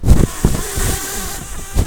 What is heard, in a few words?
bumblebees, Pascuorum queens